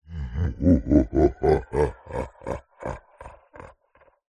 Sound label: horror evil laughter monster deep voice; zapsplat